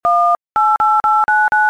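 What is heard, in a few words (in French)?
Compose numero, Téléphone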